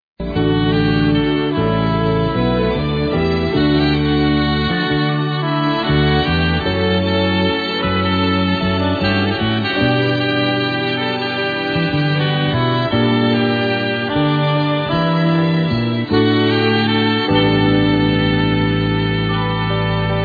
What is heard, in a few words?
20 bpm